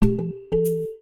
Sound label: Thumb Piano, notification; Samsung Galaxy Z Fold 7; Sound Theme